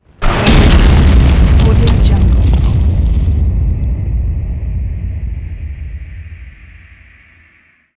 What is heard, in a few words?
debris